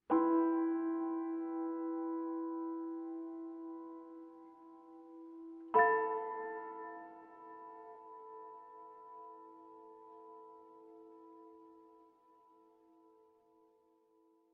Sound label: tune